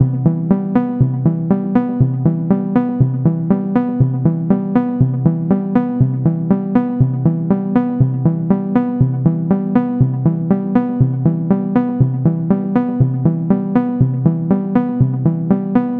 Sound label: Low Ascending Four Notes; quad Track